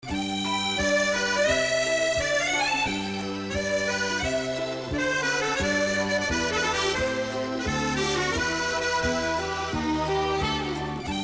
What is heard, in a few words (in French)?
Musette En Mi Majeur